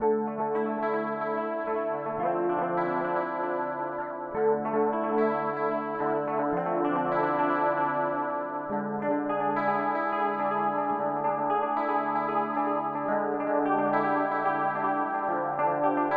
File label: Eventide H9 Dark Chorus